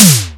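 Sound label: electronic tom, electronic drums; Crypton; DrumBase5000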